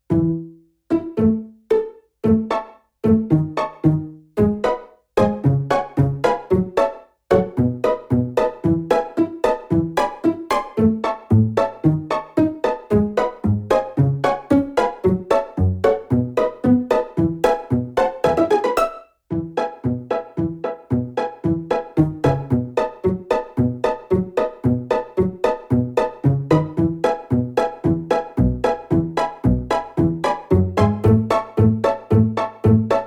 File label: Playful Synth Pop Pizzicato